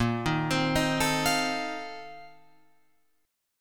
Bb Major 7th Suspended 2nd Suspended 4th, Guitar, Open F; arpeggiated